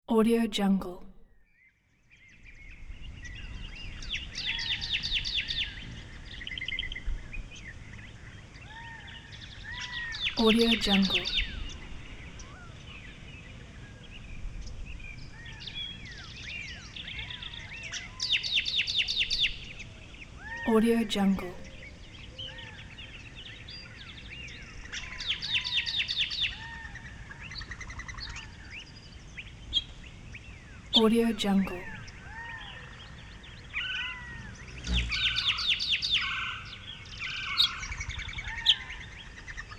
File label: birds in forest